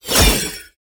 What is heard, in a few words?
Magic Hit